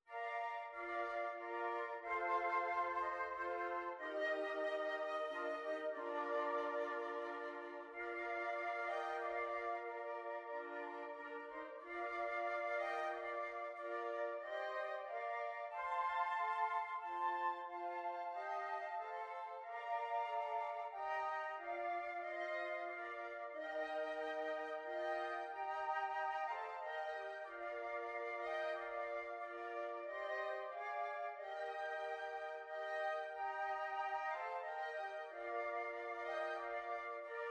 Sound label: Flute Quartet; Silent Night